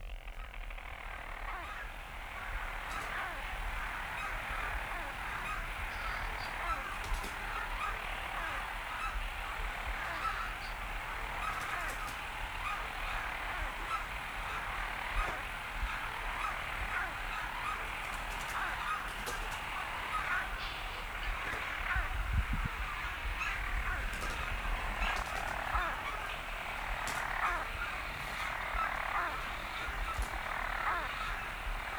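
after midnight in centre of courtyard storm petrels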